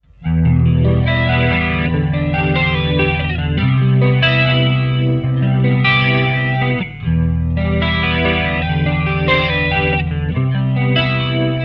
Chorus

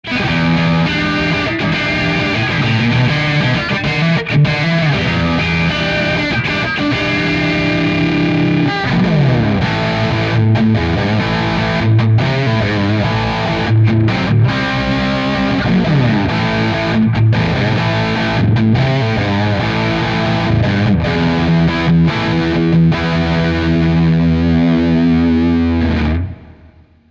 Rhythm Amp; Neural DSP Archetype Rabea

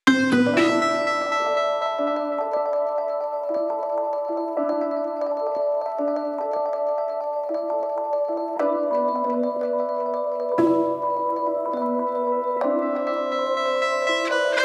Leadsounds Rhodes stereo extreme

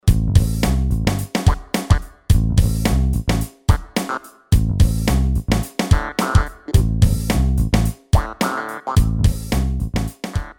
funk jam

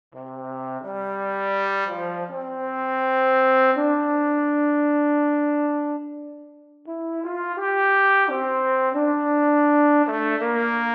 Trombone; VSL

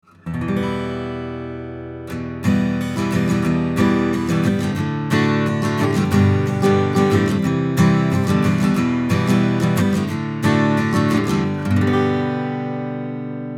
U67 Cardioid Bass Roll Off Ac Git Chords; Triton Pre

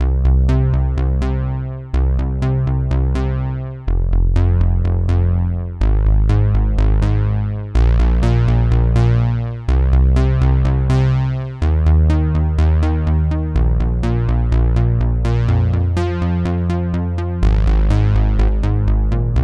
Simple Sawtooth Bass; Groove Synthesis 3rd

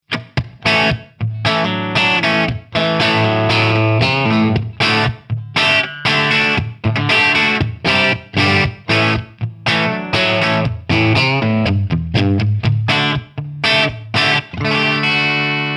Carl Martin Plexitone Single Coil Bridge

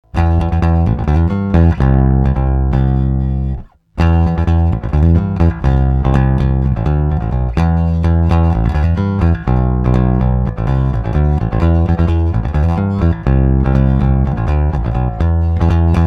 Ampeg rock Rickenbacker Plektrum; Div Pickuppositionen

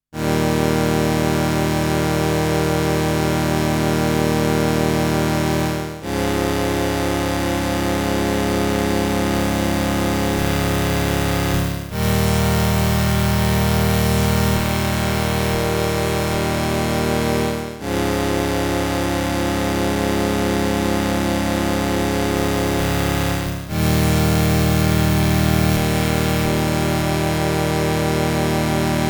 ASM Hydrasynth Bit Reduction

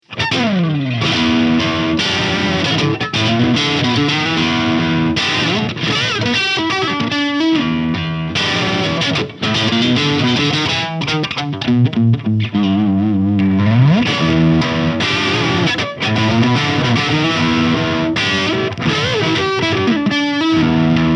ESP LTD Eclipse ´87 Rainbow CRK Crunch SC HB

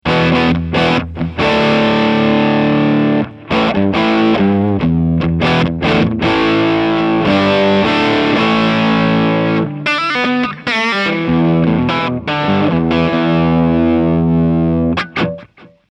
gain full steh hb deep

